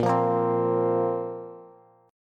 A sharp Suspended 2nd A sharp down Bass

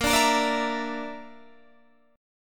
A sharp Major Flat 5th, Guitar Standard; strummed

